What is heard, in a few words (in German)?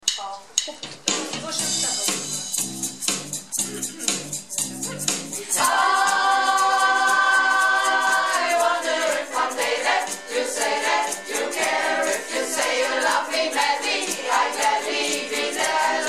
chor Probe; projektchor